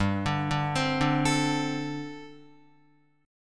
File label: G Suspended 2nd Suspended 4th, Guitar; Gsus2sus4; arpeggiated